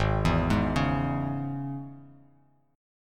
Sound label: G sharp Suspended 4th Sharp 5th G sharp, Bass Standard; G#sus4#5; arpeggiated; JGuitar